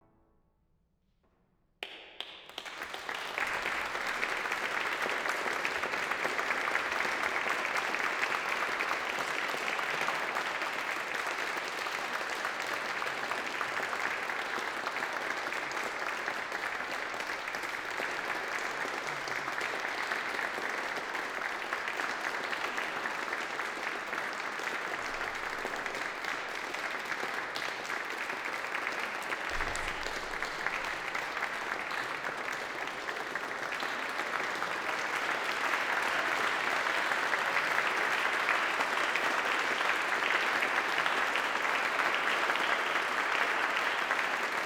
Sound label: Applause